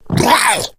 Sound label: female zombie growl; player; voice; attack; source engine; left 4 dead 2; boomer